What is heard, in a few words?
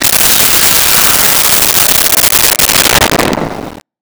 Missile Fly By, Weapons; 1001 Sound Effects